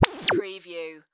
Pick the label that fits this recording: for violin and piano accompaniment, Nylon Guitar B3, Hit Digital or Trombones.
Hit Digital